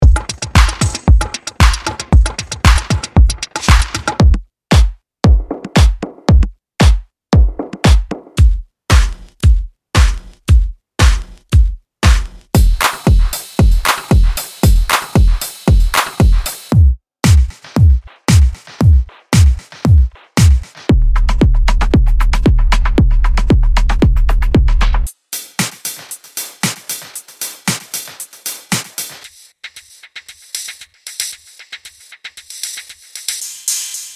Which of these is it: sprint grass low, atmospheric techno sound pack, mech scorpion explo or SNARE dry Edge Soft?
atmospheric techno sound pack